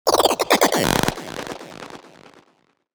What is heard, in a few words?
Laser; LD42 SARD inc new ship